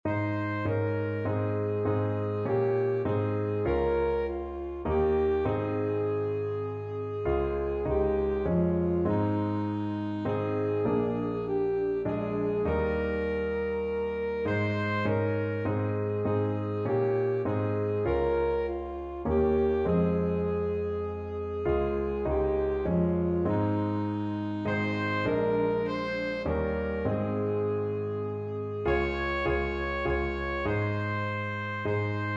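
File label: faith of our fathers, alto saxophone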